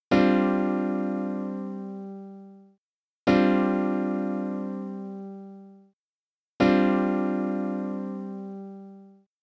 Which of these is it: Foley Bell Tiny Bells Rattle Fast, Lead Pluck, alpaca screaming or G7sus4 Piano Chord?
G7sus4 Piano Chord